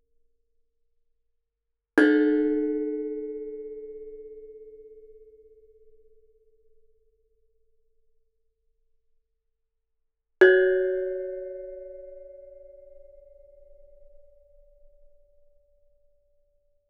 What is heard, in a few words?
Gamelan; Propeller Island - Complete Gamelan